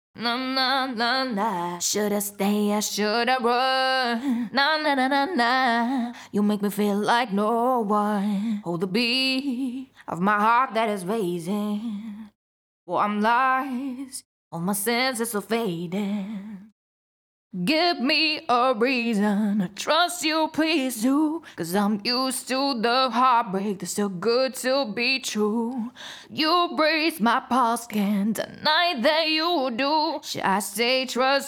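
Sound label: CLEAN LEAD (Ab Major), Pop Vocals, Acapellas; HEART BREAK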